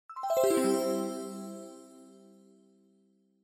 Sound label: Scene Change Down